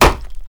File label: gun wood impact